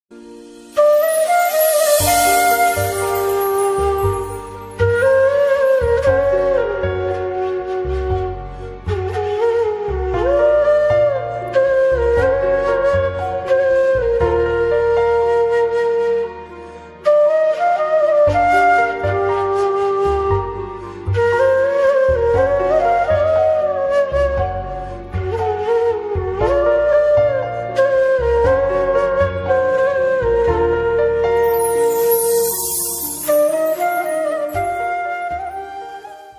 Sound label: instrumental ringtone flute ringtone sad music ringtone; kalank